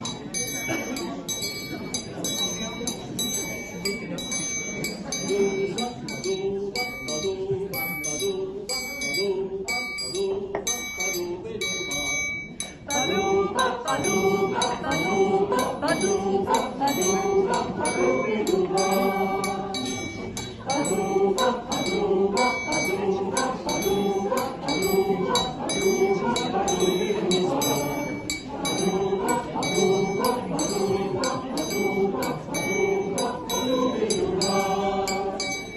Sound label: Ba du bah